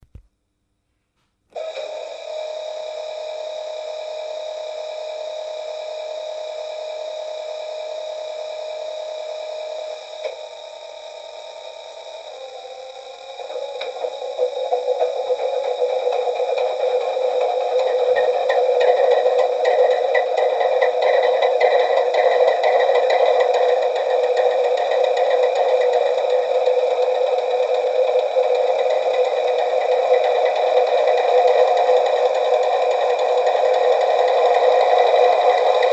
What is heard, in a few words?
Diesel Canal Boat; mtroniks